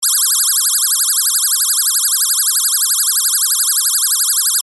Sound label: alarm